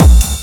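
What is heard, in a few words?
bass drum; BAS PERC; eXtended Instrument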